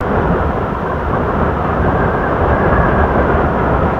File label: aura light breeze, ambience